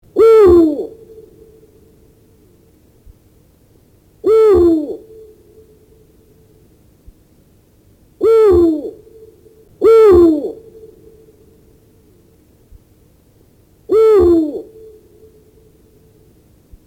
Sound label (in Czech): Vyr velky